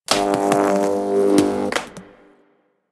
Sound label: Electric Step; Enemies; Steps; Teralyst; Warframe; Eidolon